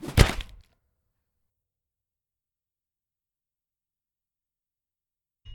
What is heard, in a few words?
Axe Impact